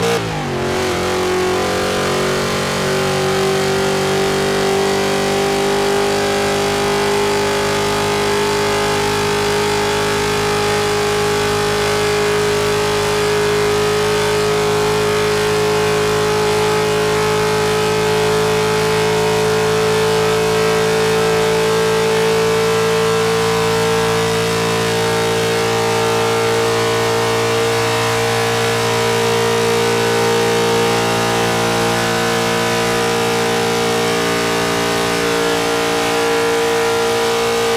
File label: fourth cruise, vehicles; rtrx new